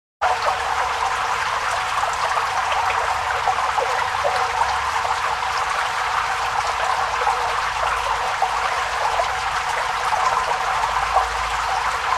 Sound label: Water flows in the shower